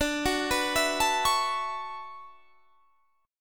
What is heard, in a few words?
B Minor 11th D